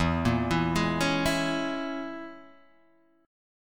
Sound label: A sharp Minor 7th Flat 5th E